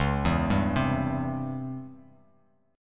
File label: C sharp Minor Major 7th Flat 5th Bass Standard; C#mM7b5 arpeggiated; 9,7,5,5; JGuitar